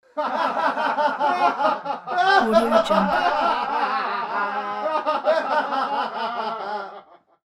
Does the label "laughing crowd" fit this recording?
Yes